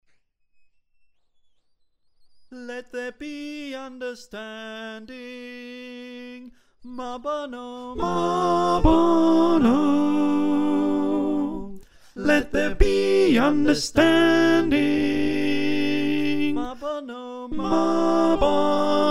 alto, choir

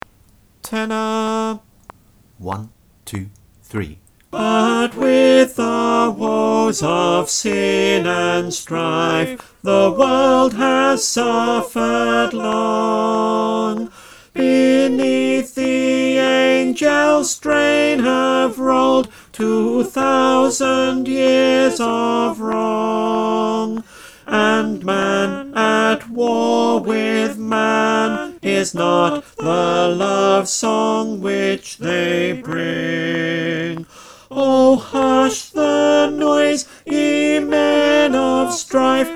It Came Upon - verse 2 - tenor click

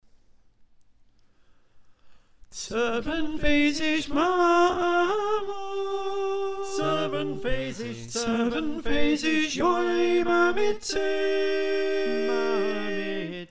Soprano

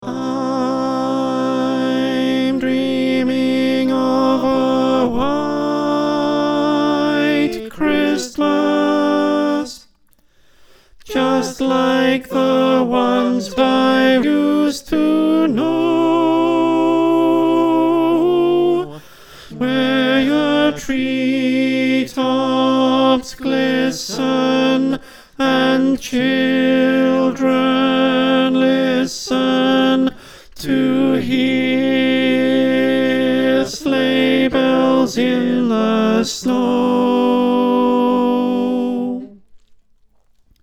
alto, choir

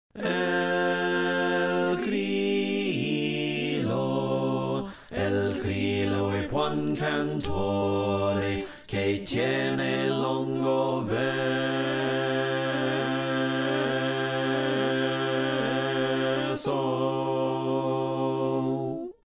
Slow Bass

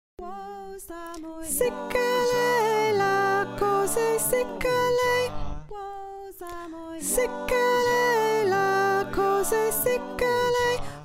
Soprano, choir

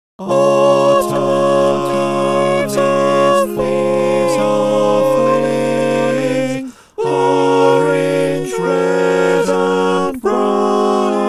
SATB, choir

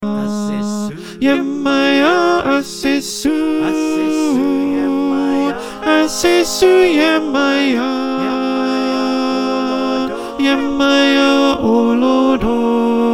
Alto line, choir

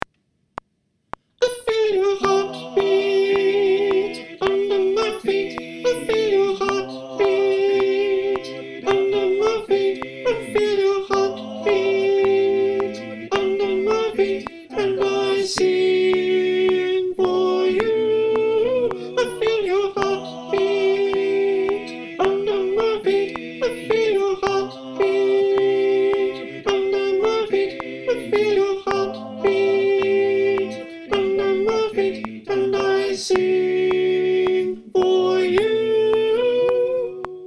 sopran click

